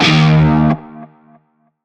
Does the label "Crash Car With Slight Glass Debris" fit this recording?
No